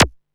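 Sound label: MISC Left, Techno 138 BPM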